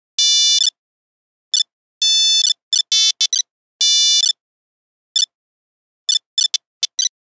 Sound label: Funfair, Nokia Buzzer; Windows Phone apps